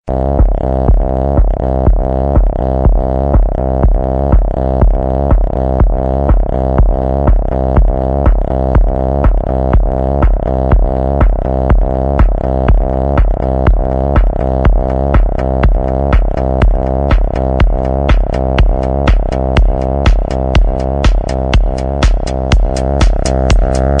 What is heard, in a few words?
rosemary punk rock